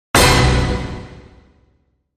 Hit Orch Lonely Heart B

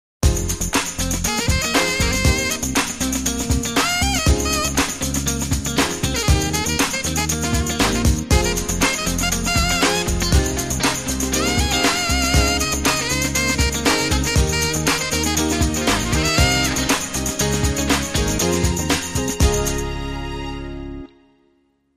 Break Beat Sax Tune

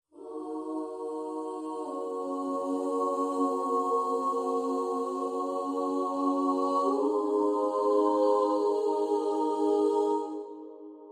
Intro Choir

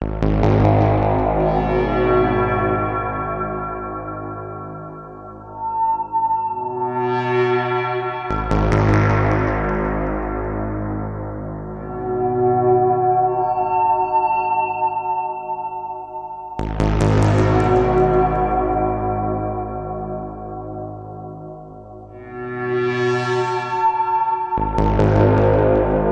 Ominous Tides Music Pad

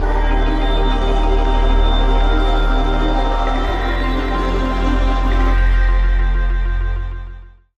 Link Sting

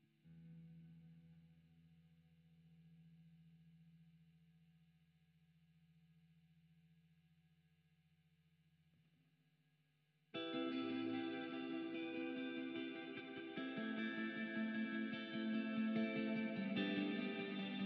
Main Guitar

